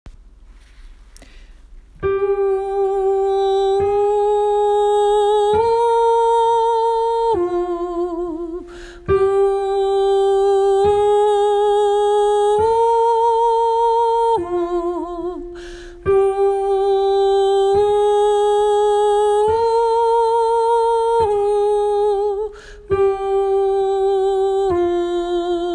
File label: sopran; åfjord